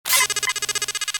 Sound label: computer beep; klingon